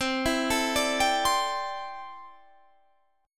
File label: C 6th Add 9th C down; C6add9 arpeggiated